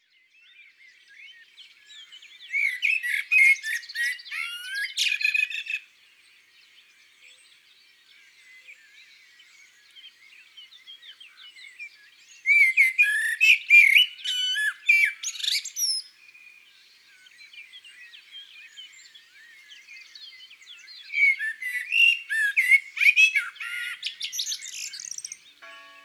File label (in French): Merle noir, Chants d oiseaux dans la campagne